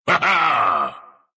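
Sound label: laugh, Russian; dota2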